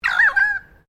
Bird Currawong Call, Currawongs from Bright Victoria; Birds (Sound Effects:Animals)